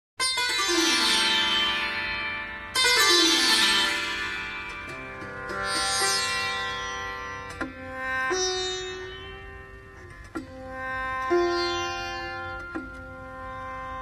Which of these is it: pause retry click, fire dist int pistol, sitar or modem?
sitar